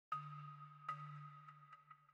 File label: Balafon; Frozen Flame; windows